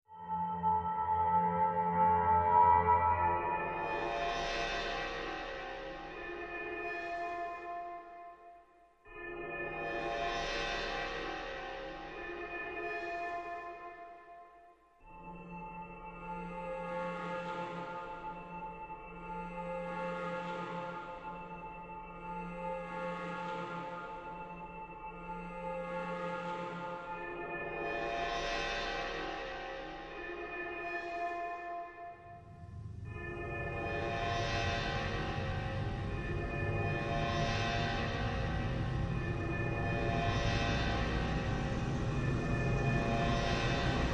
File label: Drones